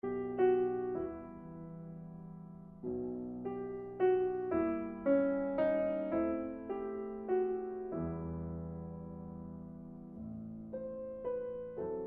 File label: Violin Sonata in B Minor, II. Andante Espressivo